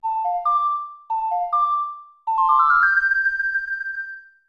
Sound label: Ocarina; Zelda - Ocarina of Time